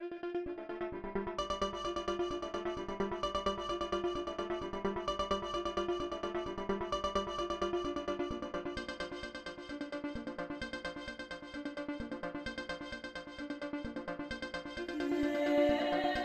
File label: techno